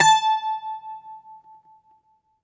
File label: Greek Bazouki, plucked strings